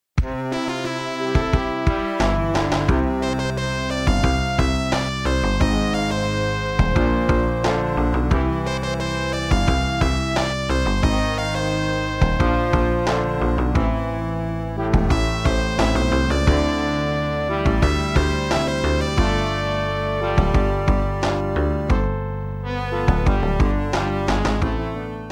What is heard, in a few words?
ending tune; sonic 2